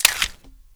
1911 mag out, colt; svencoop; hunger; weapons

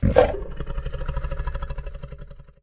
alerted; svencoop; tor

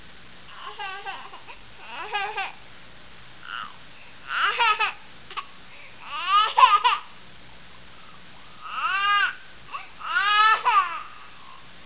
crying loop; svencoop; hunger; thambs

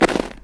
snow; svencoop

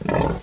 run grunt; voltigore

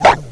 pit drone pain; svencoop

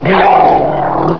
pain; hunger; hungerhound

